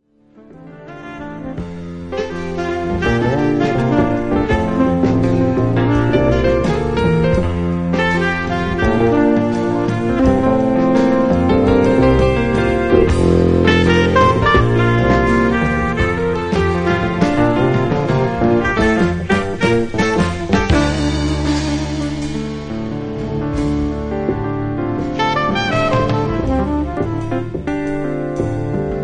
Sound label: Saxophone